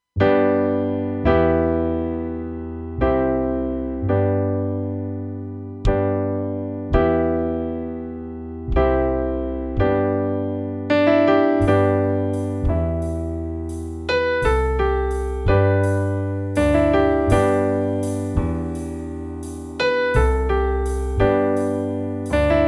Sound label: Karaoke Version